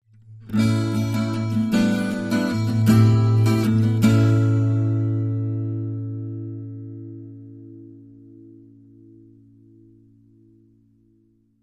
Acoustic Guitar Happy

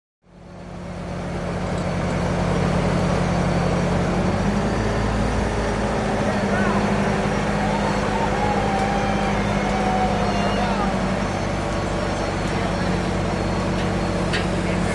Hydroplane Dock cra

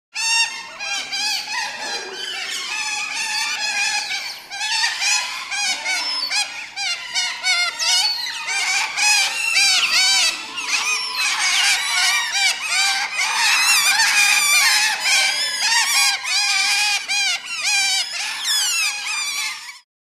Otter Small Clawed

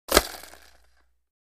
Roller Blade Spin; roller blading

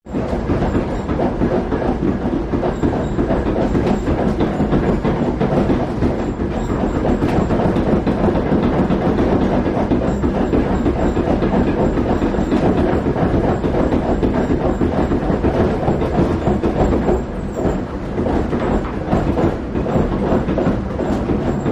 Steam Train Boxcar, steam train

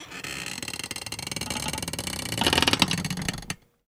Chair Creak Lean Bac, squeaks & creeks

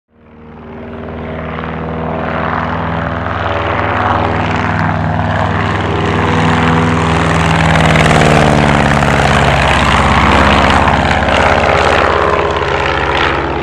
Prop Plane Fly By Old, Old Prop Aircraft Fly; Old Prop Aircraft Flying Up And Over, Then Lands In Long Shot

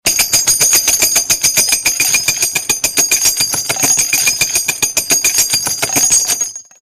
Machine Gun Burst Shell, eject shell

